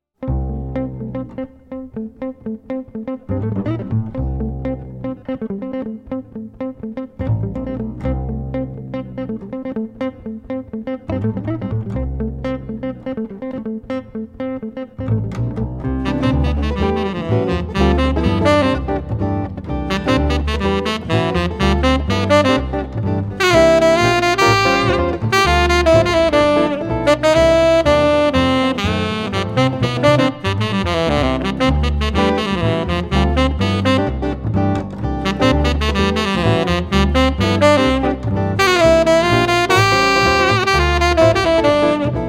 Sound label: ensemble, jazz ensembles